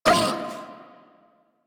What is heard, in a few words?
Feral Vocal Pain; Enemies; Kubrow; Warframe